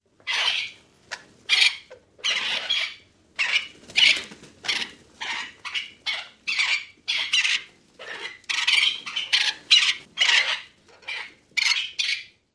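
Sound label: calls of captive, bird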